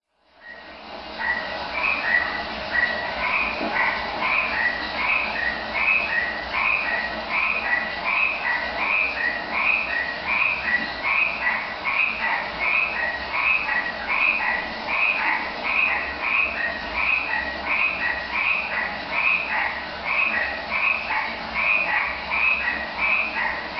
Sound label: frogs